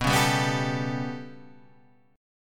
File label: B 7th Suspended 2nd Sharp 5th down, chord; B7sus2#5 strummed; JGuitar